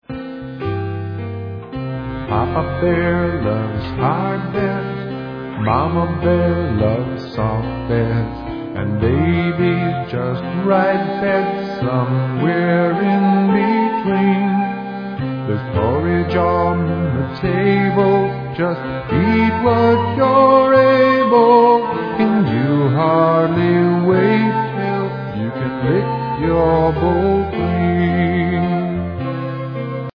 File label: Lullaby